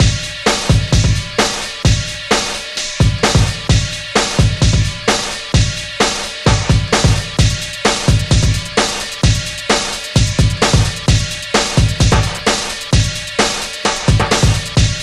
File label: Bangin 130bpm; Buffy the Vampire Slayer; Xbox